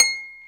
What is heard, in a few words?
HRP C. HARP; Roland - LA Composer vol. 1